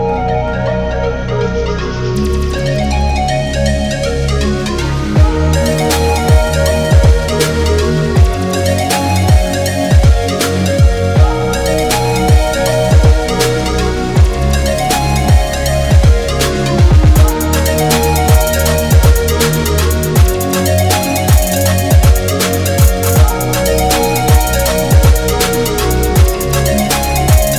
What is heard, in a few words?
Apache Trap